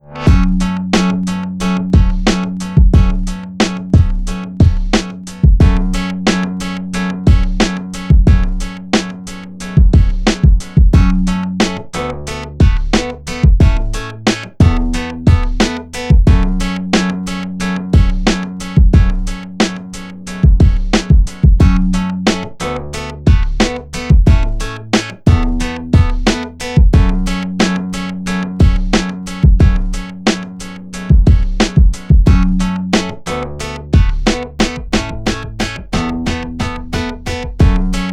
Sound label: corporate picking